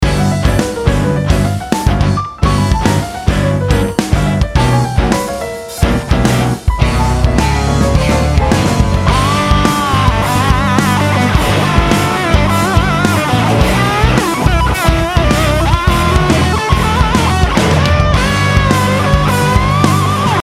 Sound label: solo; the main idea here was to introduce two different approaches over the same harmony- one being more jazzy and the other with a rock vi